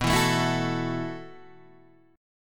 B 7th Suspended 4th B down Guitar Open D

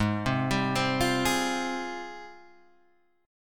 Ab Major 13th, Guitar, Open G; 6,5,5,5,6,6

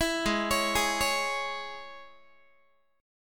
Ab Suspended 4th Sharp 5th Guitar; arpeggiated; Absus4#5; 19,16,16,18,17,x

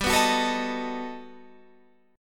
G Augmented Major 9th, Guitar, Standard; G+M9 strummed; JGuitar

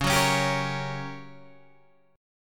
G Minor Major 7th Flat 5th, C sharp

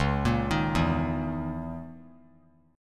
C sharp Minor 6th Add 9th C sharp; C#m6add9; arpeggiated; JGuitar

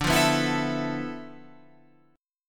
D Minor 6th Add 9th D, Guitar, Open F; Dm6add9 strummed; JGuitar

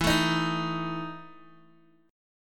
E Major 7th Suspended 4th E down Guitar Open G

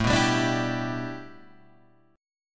A 7th Suspended 2nd Suspended 4th Guitar Open G

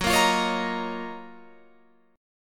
Gb Major 13th Guitar Open F; GbM13 strummed

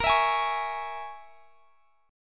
Bb Minor 7th Flat 5th, Guitar, Standard; x,x,20,21,21,21; strummed; chord shape

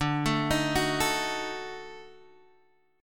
D sharp Minor Major 7th Flat 5th D

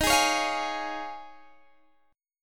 Eb 9th Suspended 4th, Guitar Open G; down

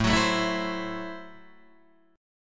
A Minor 6th A Guitar DADGAD

